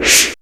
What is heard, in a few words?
Perc FX, Percussion; Best Service ProSamples vol.37 - Dance Synths